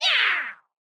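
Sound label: In Her Image damage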